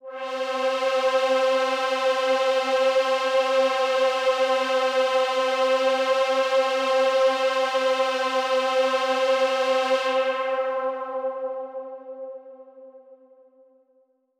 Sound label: Big Pad C3; KG MegaSaur